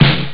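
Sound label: snare drum; Amiga Soundtracker Sample Packs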